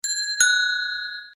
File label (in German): Fahrradklingel Bang